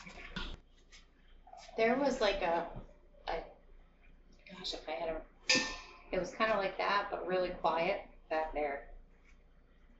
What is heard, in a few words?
Basement Corner EVP; caliente pizza draft house crafton investigation